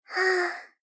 Gentle Trouble "Haa"

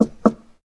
Door Knock

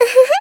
Laugh Uhuhu

